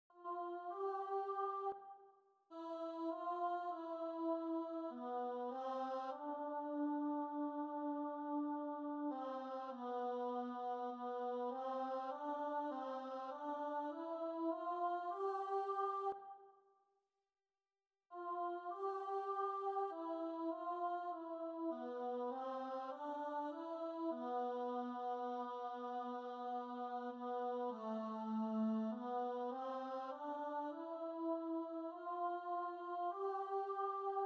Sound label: choir